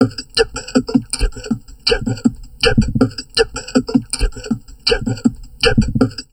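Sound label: talk, drum loops crazy processed, 160 bpm; USB Soundscan vol.07; AKAI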